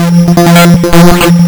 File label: lsdj waves; wavetables